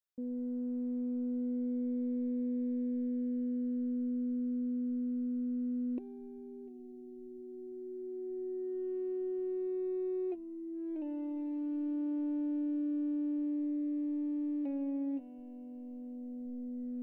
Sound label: Ebow Chorus